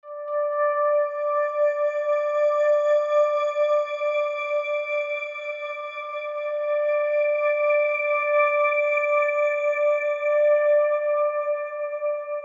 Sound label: High Glass Pad